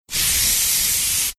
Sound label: spraying sound